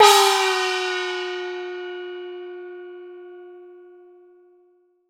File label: GCO HI MID, orchestra hits; Best Service; Peter Siedlaczek's Advanced Orchestra